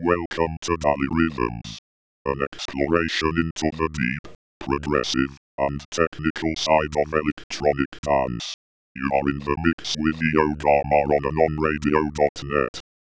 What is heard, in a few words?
rhythms intro